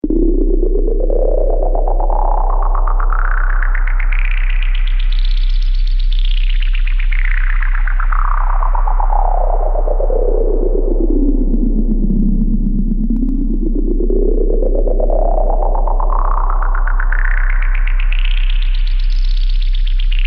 Sci-Fi Ambiance, Eerie retro sounding Sci-Fi ambiance.